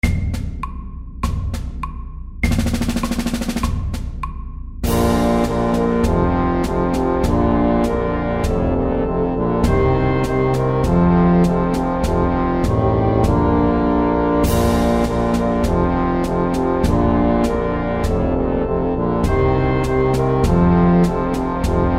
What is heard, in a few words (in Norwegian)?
Elefantmarsj FORT